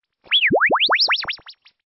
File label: Stinger; STINGERS